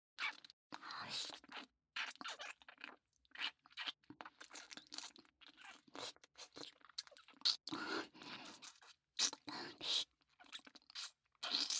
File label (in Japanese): 乳首舐め右; ループ素材